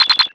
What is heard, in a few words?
Error; WarGodsShop; cstrike